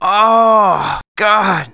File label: BURNED; Messiah